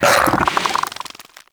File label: Bug Vocal Tranquillized Grunt; Characters; Warframe; Hunting Critters; InfKDriveBug